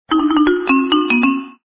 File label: invite